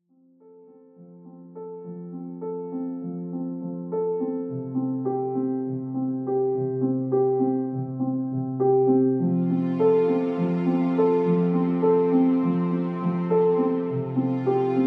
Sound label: SLEEP PIANO; SENSE